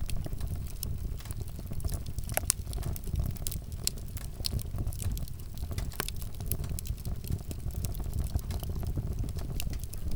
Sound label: Fire Burning; Loop